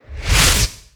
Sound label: swish; gonarch